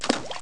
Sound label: splash